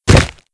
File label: Floor Door; Playable Tutorial Level